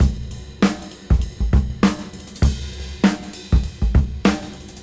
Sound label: Swing 98 BPM; AMG; Keith LeBlanc Phat Butt Jams